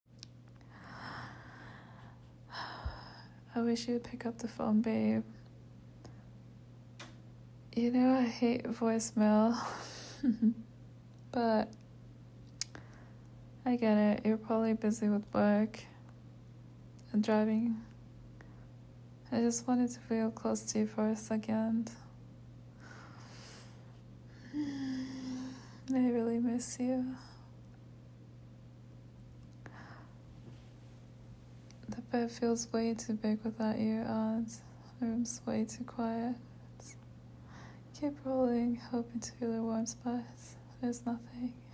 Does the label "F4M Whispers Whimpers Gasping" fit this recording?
Yes